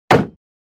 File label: minecraft block